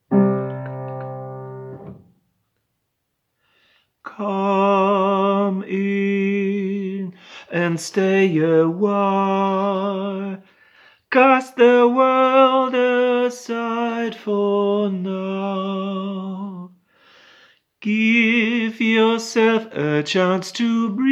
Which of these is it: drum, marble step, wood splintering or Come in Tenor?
Come in Tenor